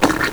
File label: squishy hit; wesnoth